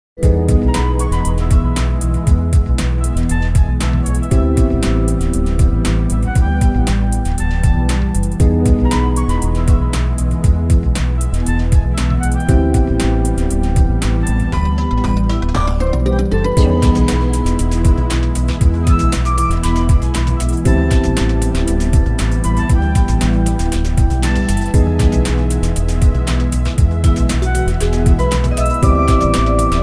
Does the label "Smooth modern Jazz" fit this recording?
Yes